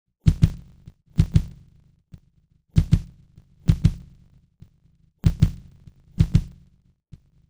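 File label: drum, separated component 2; Separation of Sound Sources by Convolutive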